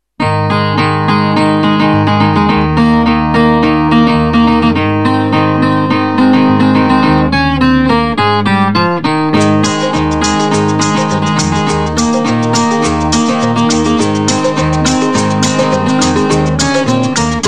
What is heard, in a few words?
Guitar Ringtone